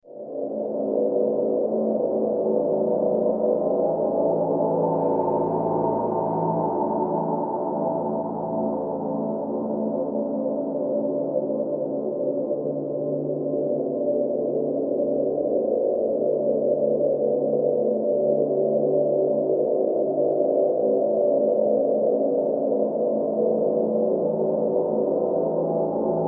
Drone Horror Tonal Haunted Atmosphere Ominous Suspense Eerie; Scary Soundscape